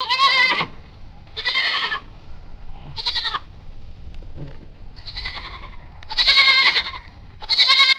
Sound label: pitched down goat bleating repeatedly; animals